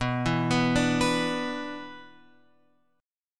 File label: B-Minor Double Flat 5th; Bmbb5 Chord {7 7 9 7 X 7} arpeggiated